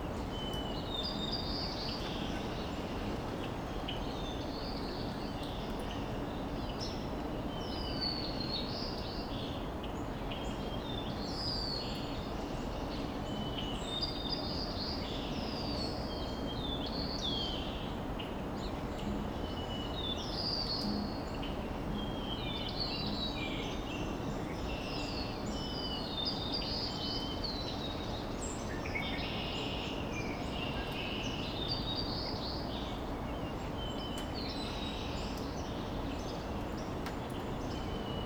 Two white crowned sparrows and eventually a white throated sparrow; with at one point a cardinal; morning; new world sparrow morning from may 2024